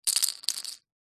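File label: leisure poker chips handful set down hard surface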